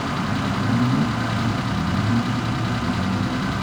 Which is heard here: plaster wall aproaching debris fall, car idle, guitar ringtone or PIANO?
car idle